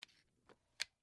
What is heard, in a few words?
Wood Box Latch, Foley, Wood, Box, Latch, Small; Materials; BlastWave